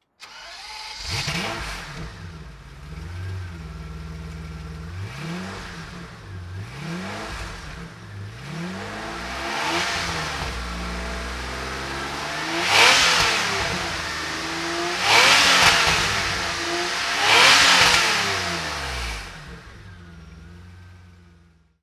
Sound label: BMW M8 850 throttle